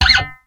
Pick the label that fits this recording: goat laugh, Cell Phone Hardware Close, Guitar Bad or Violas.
Guitar Bad